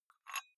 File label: dumbbell take off very small weight gentle; sport freizeit; fitnessstudio